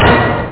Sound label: ORCH STAB